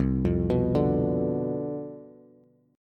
C Major 7th Suspended 2nd Suspended 4th C down Bass, chord; CM7sus2sus4 arpeggiated; JGuitar